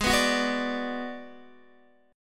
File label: Ab Minor 7th Flat 5th Ab, down, Guitar, Open D